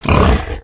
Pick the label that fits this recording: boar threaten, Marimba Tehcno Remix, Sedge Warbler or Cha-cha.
boar threaten